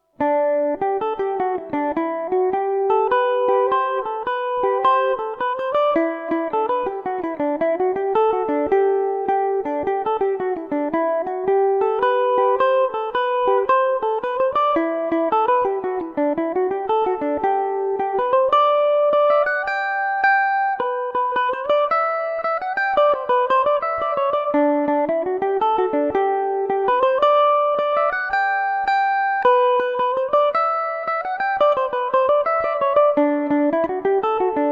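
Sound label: belfast almanac G major revelation, mandolin; The Belfast Almanac; Revelation Jaguar electric mandolin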